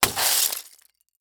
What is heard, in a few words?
Crash Box Full Of Broken Ceramics Dropped; Crashes